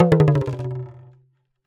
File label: Talking Drum Bounce; Percussion Samples